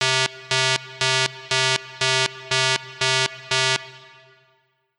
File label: classic alarm